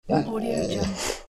dog frustrated growl; fascinatedsound

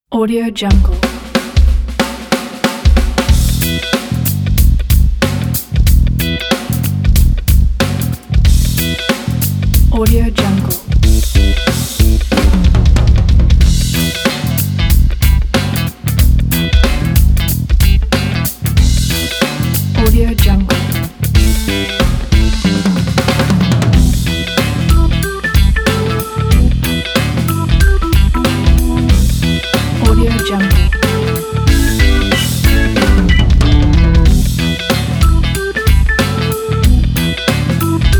funky groove; soapbox; soapboxaudioco

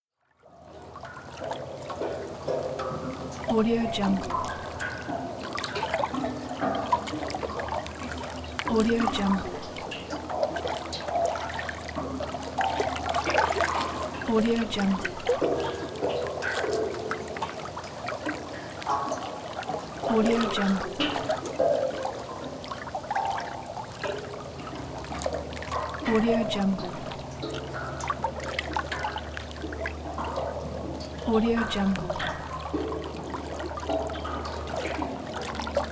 water drops in sewer

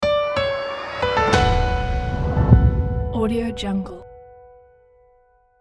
expert authority corporate logo

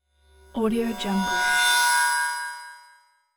whoosh shimmer; whoosh effect; hollywoodedge

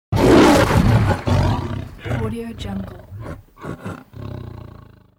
tiger

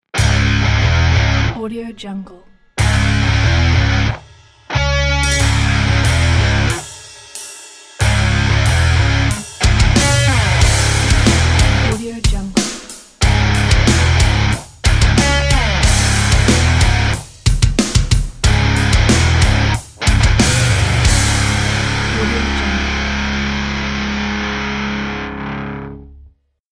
metal ident

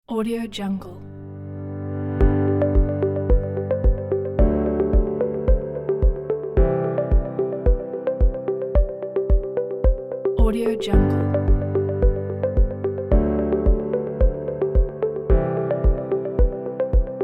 ambient music background

